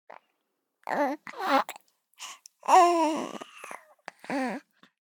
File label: BABIES YAWN Boy 3 months; Baby Boom